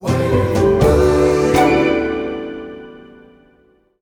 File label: Cinematic Music Stinger